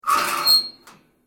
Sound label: Metal plates scrape; Material components to create more complex sounds; Materials